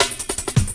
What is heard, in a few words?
Old School Break, Drums